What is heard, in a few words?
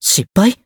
Throw Whiff; BBCF; Hibiki Kohaku